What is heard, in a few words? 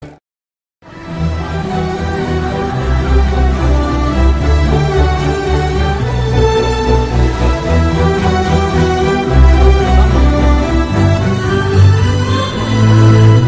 heart breaking love music bgm